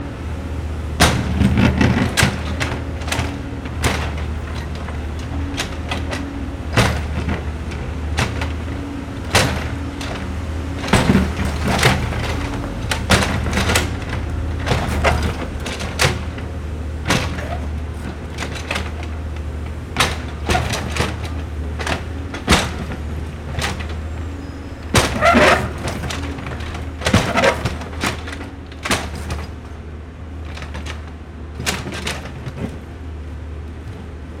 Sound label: digger sound; building site; Let's Build! A Building Site Sound Story and Soundboard; construction